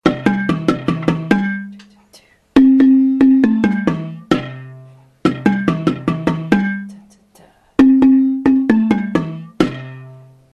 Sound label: baritone variation, marimba; chiradza